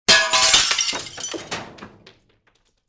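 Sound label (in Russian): камень среднее окно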